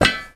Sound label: Metal footstep